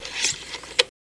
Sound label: Telephone Antenna